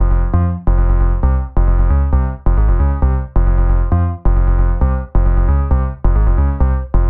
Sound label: Techno Melodic Techno